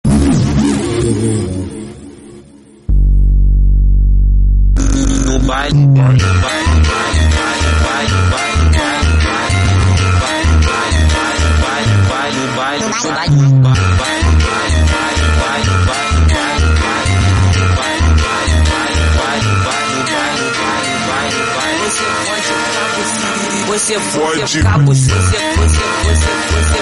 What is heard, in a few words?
Vocal Ritmada, funk